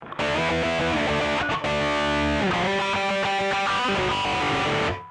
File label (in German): hard, gitarren